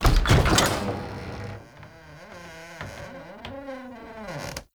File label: Wood Shutters Open Slow; Puzzles; Wooden Shutters